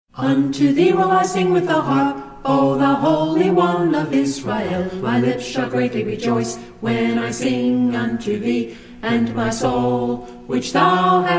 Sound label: a capella scripture song; psalm